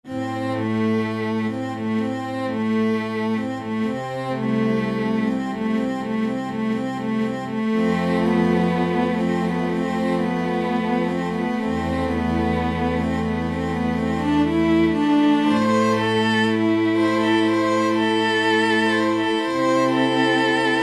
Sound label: CMB Chamber Grp; Roland L-CDX-04 Strings And Orchestral Percussion